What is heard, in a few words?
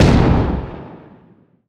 ship hit small effect; Hearts of Iron IV Death or Dishonor